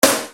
Snare Dr

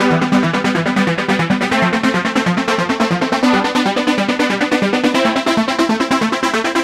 NRG Pad

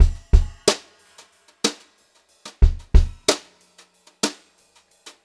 Brushed Drum Loops; USB Soundscan vol.39; AKAI

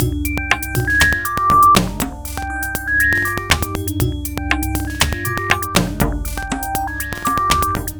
Glitched, Processed Percussion

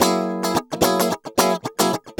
GUI, 110 BPM

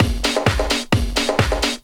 130 tribal, LOOP PERC TRIBAL; DRUMLOOPS; Ueberschall - Techno Trance Essentials

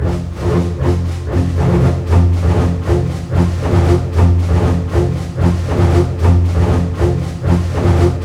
120 READY, Scoring Tools ORC Ready Fight; East West

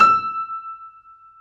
FF E 6 off, Grand Piano off mic

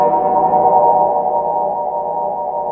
EERIE LOOP, AMBIENT LOOPS; Zero-G Ambient 1

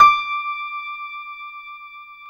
PIA MICRS, MICRO-PIANO; Sound & Vision - Gigapack II

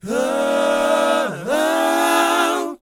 BOYZ A, Vocal Planet R&B & Dance; ILIO

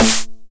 909 SD Tape Sat

